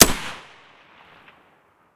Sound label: ak74 raw far; Escape from Tarkov